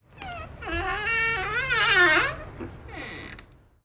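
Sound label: creak single long gentle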